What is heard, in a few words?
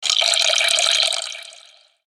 element water; maoudamashii